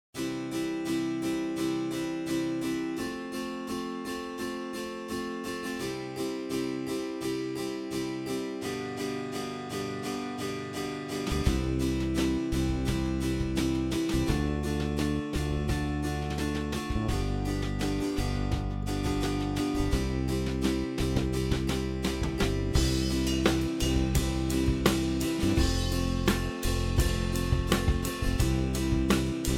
Virginal Guitar

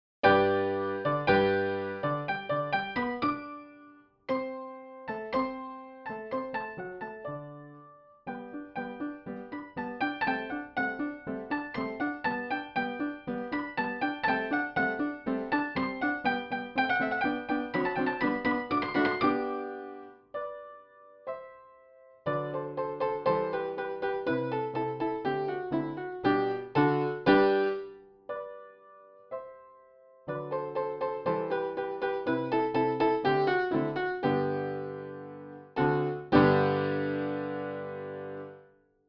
Walter Meantone Woolhouse; Mozart

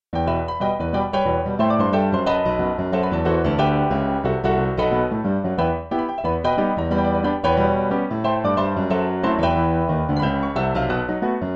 Ivory s Upright Packard Barroom

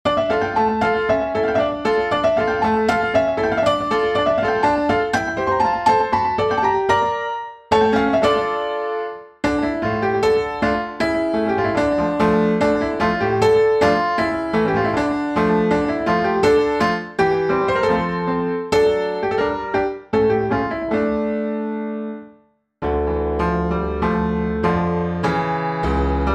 Old Piano

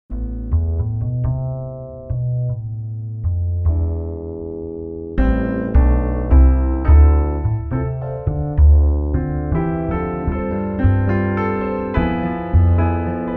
contrabass

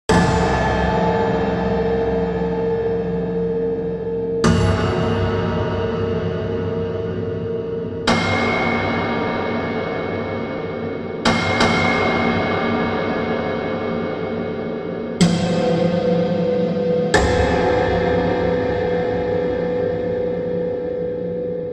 Prepared Piano

